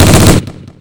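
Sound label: machine gun; Barrage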